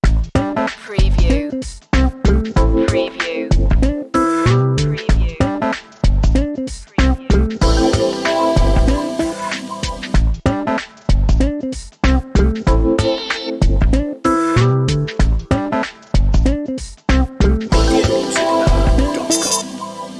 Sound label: funky summer loop